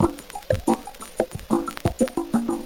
BACKBT, HipHop; LiveAct Pool

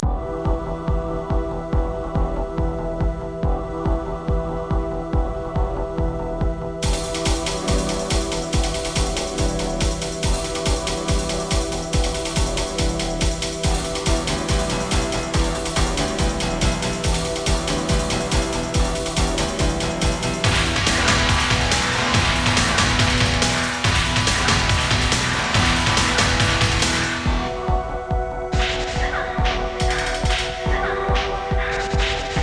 theme; Ultra Vixen

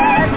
stall; 300 games for mac; Arcade; Boogers 1.12

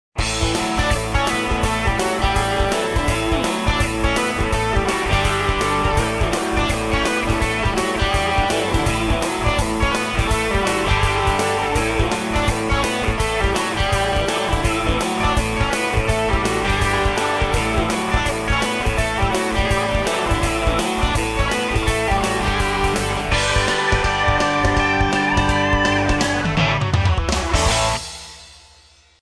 level 9; run

